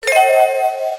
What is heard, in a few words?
Key